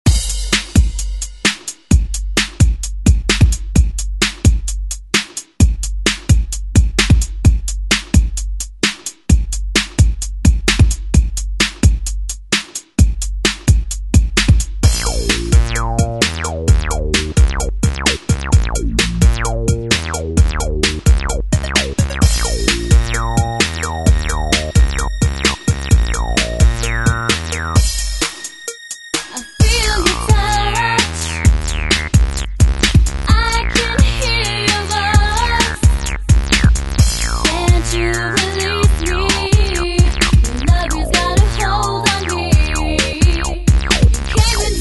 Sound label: 90s ReDrum